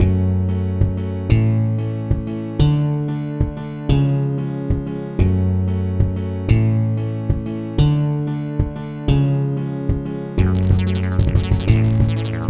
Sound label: Instrumental; friday night funkin vs. dave bambi rhythm apocalypse